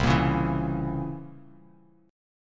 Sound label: C 7th Flat 9th C down Bass Standard; C7b9 strummed; JGuitar